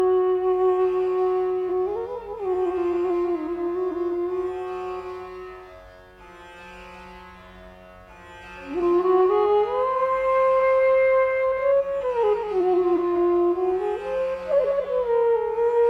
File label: Raag Kajri - Flute